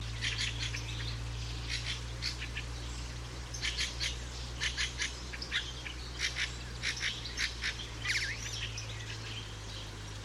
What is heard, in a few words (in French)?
grive litorne; chant